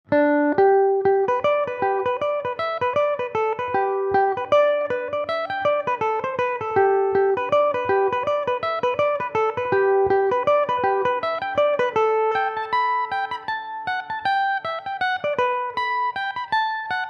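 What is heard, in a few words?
G major, Irish mandolin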